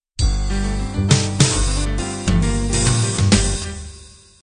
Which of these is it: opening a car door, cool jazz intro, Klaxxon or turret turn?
cool jazz intro